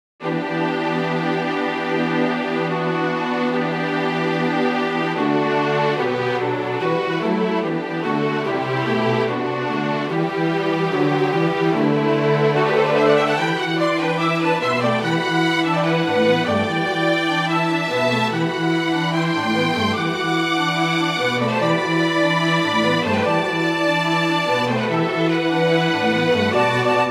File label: 4 Strings